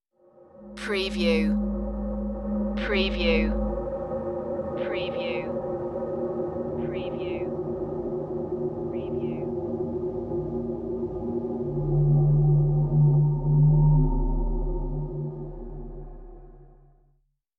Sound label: eerie atmosphere